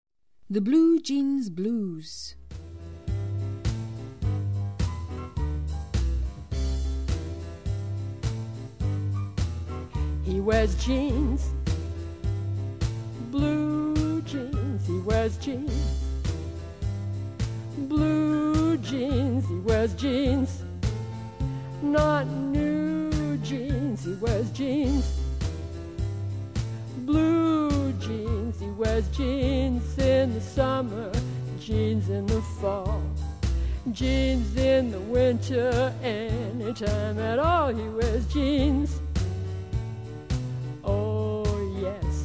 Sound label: the blue jeans blues jazz chant; Jazz Chants